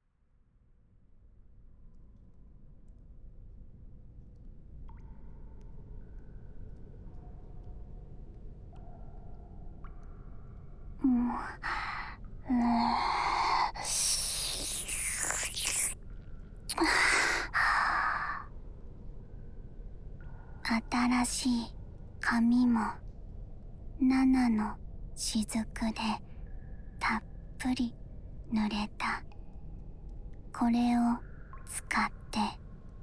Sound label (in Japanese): 髪で。耳かき。（左耳）; あやかし郷愁譚 ～七面頬 なな～